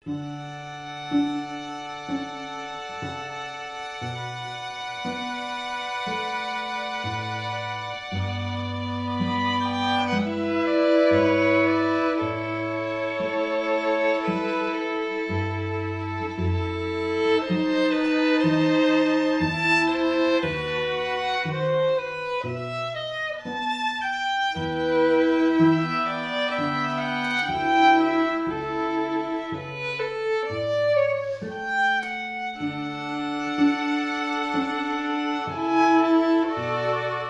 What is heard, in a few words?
strings air; carneval